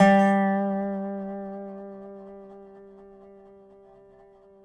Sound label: DOBSUSG3 -R, dobro notes; Country